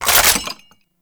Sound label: Reload Mag Out, weapons; Warframe; Scaldra AK